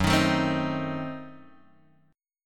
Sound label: Gb Diminished 7th, Guitar, Drop D; chordshape; down